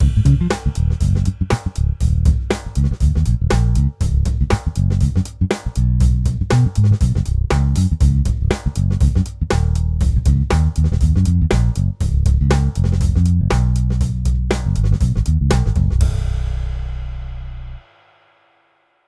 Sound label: Drive time, music beds, affects and jingles